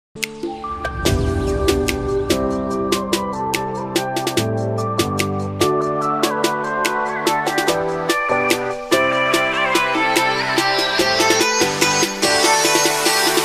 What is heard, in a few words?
harmony